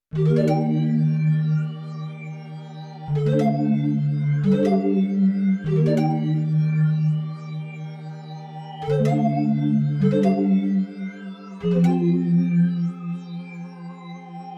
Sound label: Fluta Chorussa